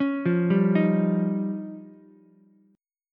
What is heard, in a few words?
F 7th Suspended 2nd Sharp 5th, Ukulele; F7sus2#5; arpeggiated; JGuitar